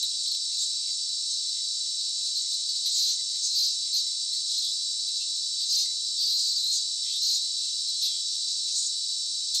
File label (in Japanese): semi fake